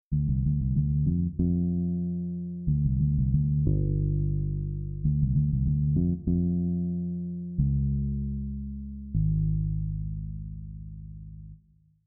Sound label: bass case